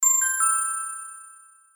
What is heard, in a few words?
new mail